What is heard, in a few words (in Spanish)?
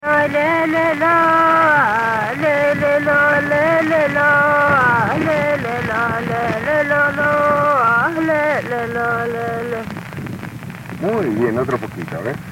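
Canto de mujer, Mapuche; La Buitrera, Neuquén, Argentina; Música Mapuche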